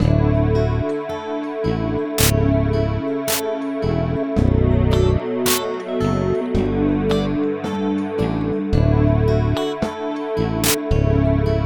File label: freeform midi